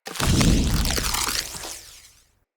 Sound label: Bow Equip; Weapons; Player; Bows; Fey Thorn Bow